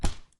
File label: Bare Footstep; Lethal Company; Windows